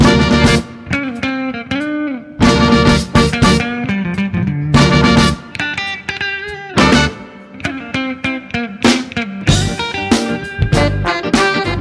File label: funky